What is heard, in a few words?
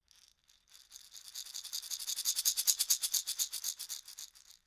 shaker wood rattle dynamic